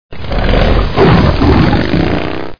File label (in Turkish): motosiklet; tasitlar